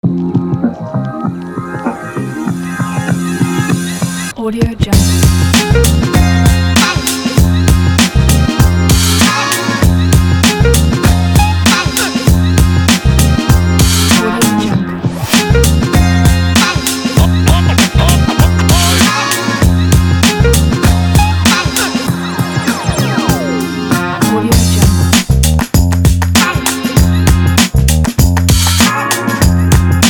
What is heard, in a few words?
hiphop this